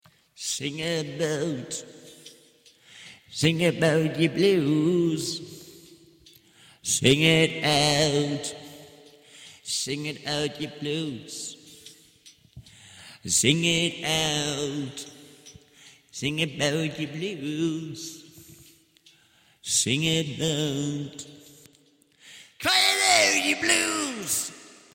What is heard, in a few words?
blues 150 Em, acapellas